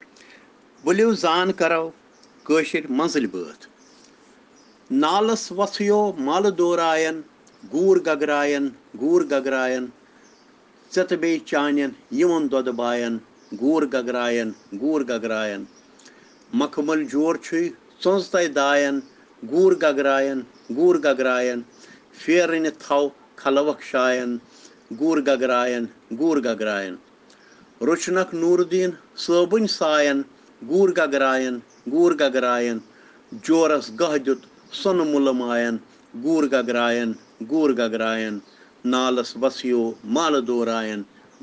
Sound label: Children Rhyms in Kashmiri